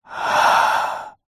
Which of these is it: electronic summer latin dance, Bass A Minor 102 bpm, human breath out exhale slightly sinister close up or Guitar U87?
human breath out exhale slightly sinister close up